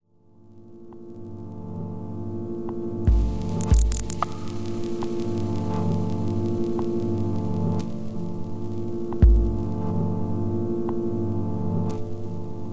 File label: piano organ